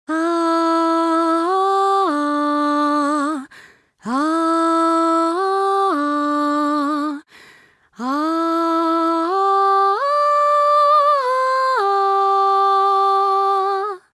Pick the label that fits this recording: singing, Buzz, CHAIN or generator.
singing